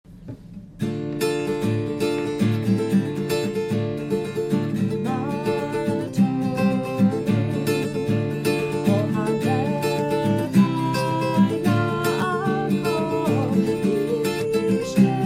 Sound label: Mah Tovu Folk